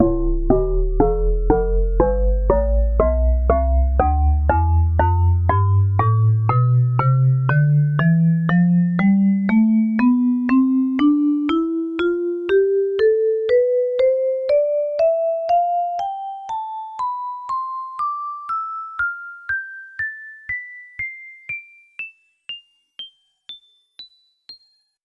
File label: vibraphone tonleiter